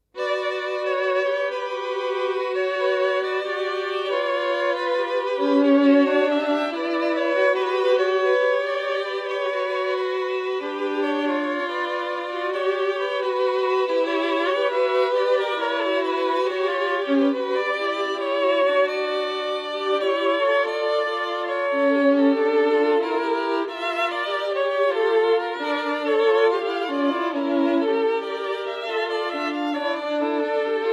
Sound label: violin